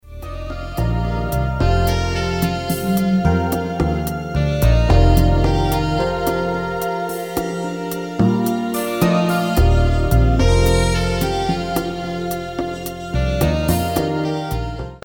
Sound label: musicbed sample, newage